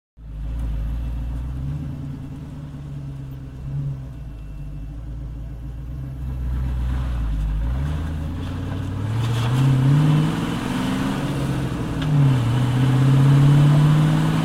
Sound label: Mustang V-8 Steady Stop & Idle, Cars